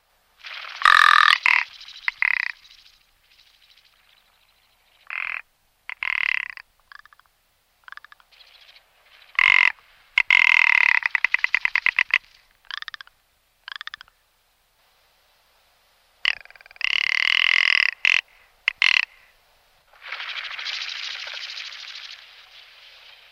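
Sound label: tundryanaya kuropatka voice, rock ptarmigan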